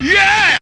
VOX

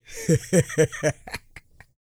LAUGH, Vocal Jazz Fx

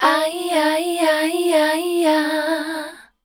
MEL D#, Voice Spectral; Best Service; Voice Spectral II

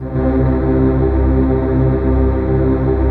RAVE PAD; Best Service; XX Large; Pads

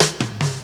DRMROLL; E-Lab; X Static Goldmine 2; akai

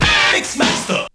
VOX; ZERO-G; Datafile

